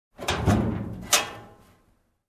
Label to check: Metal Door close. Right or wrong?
Right